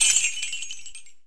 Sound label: Picking Gold